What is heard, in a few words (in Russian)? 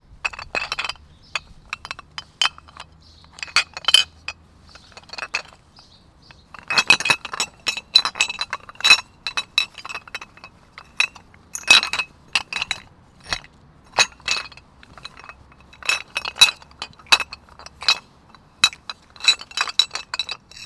zvuk butylok v sumke